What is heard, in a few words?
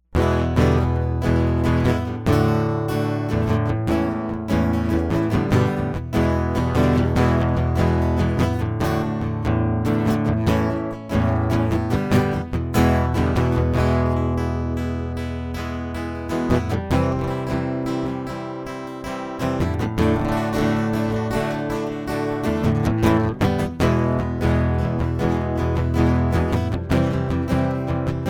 acoustic